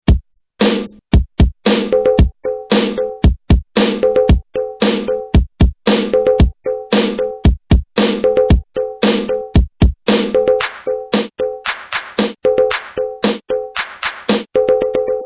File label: electronic beat ringtone